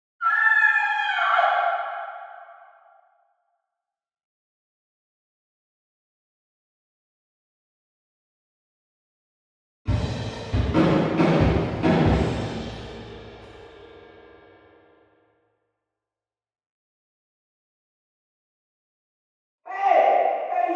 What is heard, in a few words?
boom urban narrow street